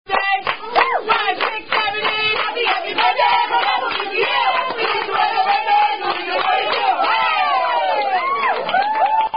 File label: Singing Happy Birthday